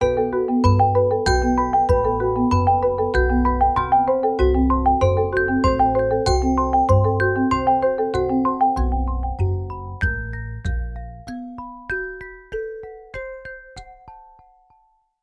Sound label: Rippling Marimba